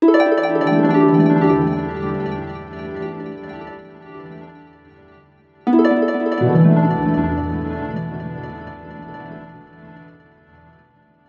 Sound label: Harps Loop Psyche Harp Em 85